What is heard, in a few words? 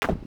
Kick